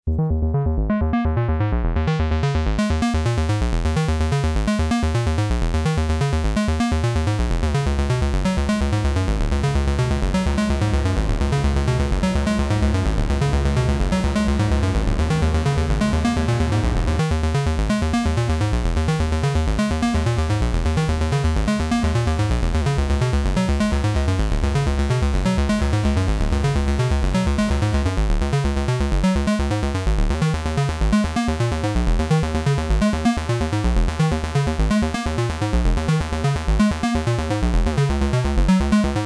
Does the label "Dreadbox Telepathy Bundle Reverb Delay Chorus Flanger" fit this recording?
Yes